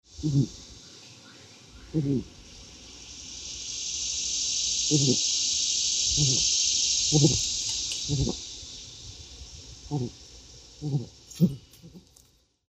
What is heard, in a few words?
P coquereli close contact, lemur; a single P. coquereli, once again acting as an outlier, emits a close contact call; resembles, structurally and contextually, the quavered version of the L. catta's "hmms."; The Audible Phylogeny of Lemurs; cicada chorus